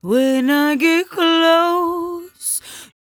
57bpm Am, Velvet Vocal; Zero-G

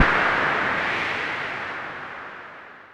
Synare 3, Filtered Noise

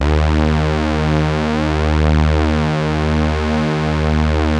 D#, GLIDE, Synth; InVision Interactive; Keith Emerson; The Most Dangerous Synth and Organ

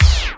Scratch, Roland JV-2080

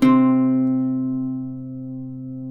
CUATRO; Spectrasonics; Hans Zimmer Guitars VOL-2

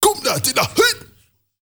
MALE RAV, MALE NOISE, Heart of Africa; Spectrasonics; Heart of Africa 1

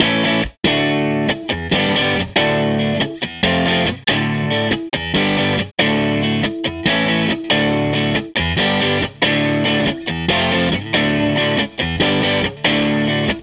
Strat Guitar Loop

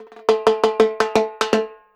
Percussion Loops, Brazilian; Houseworx

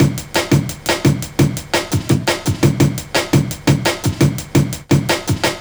LOOP, Jungle; USB Soundscan vol.05 - Explosive Jungle; AKAI

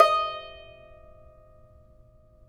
TAR; Roland - LA Composer vol. 3

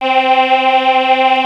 C 605 CHOR, Yamaha C605; TECHNO-BWARE

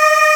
Aahs F3

